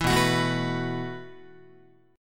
B 7th Suspended 4th B down Guitar; B7sus4; strummed

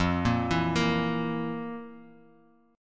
F Major 7th Suspended 4th, Guitar, Open G; down; chord shape

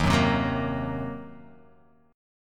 D sharp Minor 6th D sharp, down, Guitar, Open F; D#m6 strummed

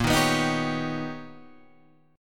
B Augmented Major 9th A sharp, chord

